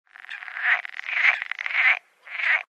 animal call frog; Source Engine; tf; lair

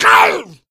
hunter shred, attack voice; source engine; left 4 dead 2

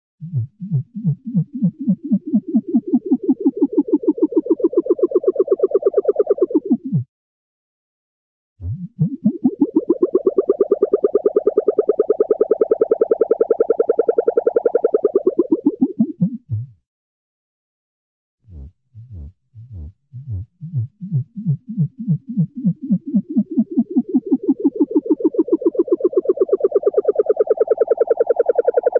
motor, dull chugging start and stop; cartoon

